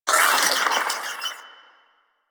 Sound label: Proto Infested Maggot Awake, Vocals; Warframe